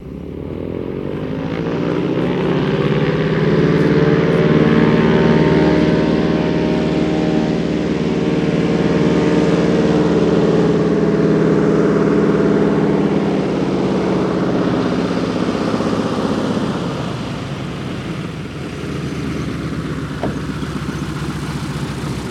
DHC Coming Landing

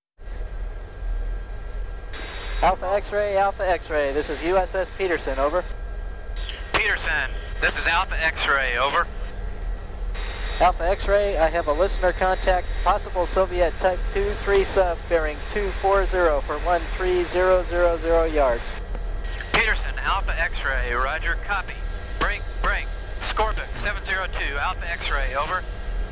Us Navy Radio, military

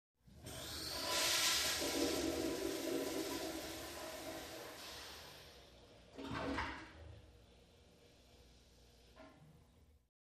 Toilet Medium Range, toilet

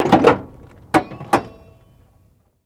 Cadillac 64 Door Open; cadillac 1964

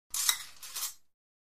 Fencing Swords Slid

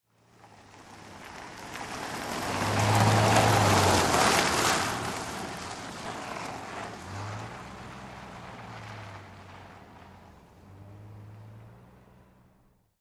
Car By Medium Speed

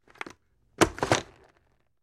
Football Helmet Pic, football

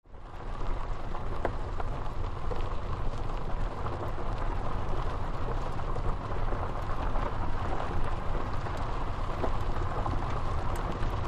Car Tires Dirt Start; tires on dirt

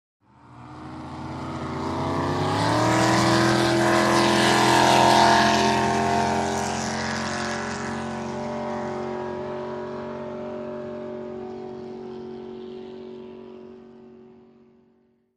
Drag Boat Start by

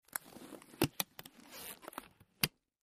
Surgical Gloves Put O, gloves

